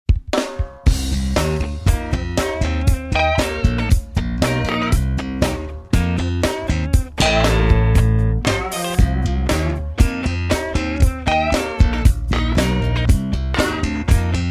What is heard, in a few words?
instrumental